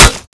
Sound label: hammer strike